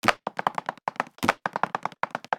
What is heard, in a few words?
tap dance footstep